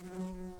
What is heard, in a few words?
Humilis workers, bumblebees